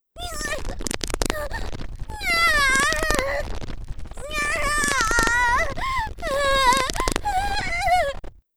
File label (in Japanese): 猫っぽい